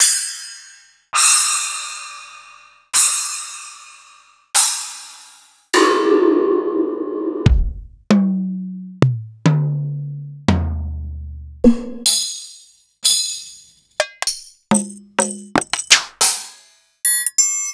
EMU Orbit

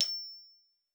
E6, GLOCKENSP S; Xsample VOL-4

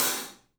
Studio Hats, 3D Percussives; E-MU Producer Series Vol. 5 – 3-D Audio Collection

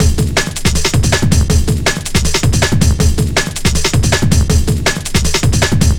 breakcore breaks

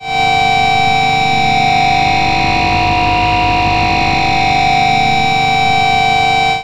Feedback, Industrial; Nasty

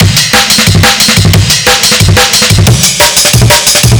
amen 3 bar; Amen Breaks

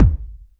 909 KICK

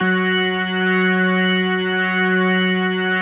F#3S, B3, Vintage Keyboards

